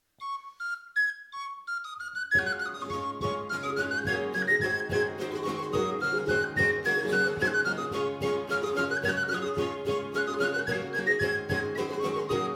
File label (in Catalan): Jota amb flabiol